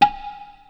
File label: metronome tick